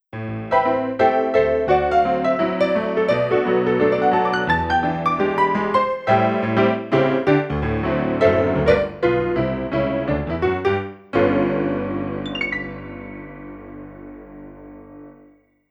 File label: honky tonk piano